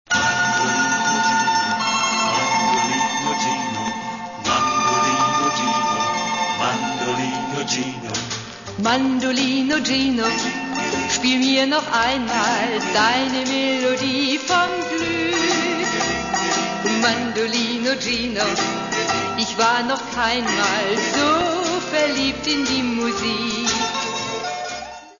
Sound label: Mandolino